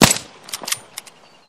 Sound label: Gun Sound; Gangstar; Gully Loops